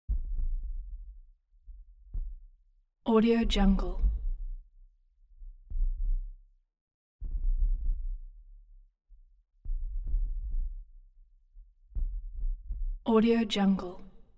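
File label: metal smash low frequency effects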